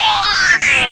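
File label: guitar, 145 BPM, drum & bass; Ueberschall; Drum & Bass Flash Trackz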